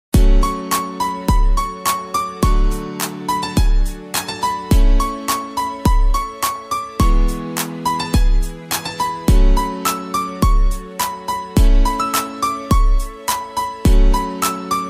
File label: bgm ringtone piano version